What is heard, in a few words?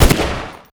galil fire; STALKER